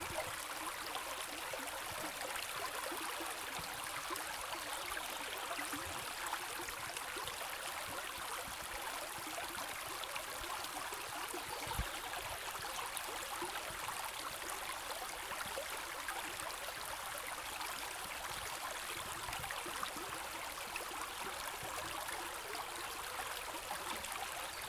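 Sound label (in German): Wassergeplätscher Bach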